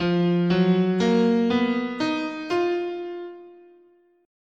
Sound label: F Iwato, scale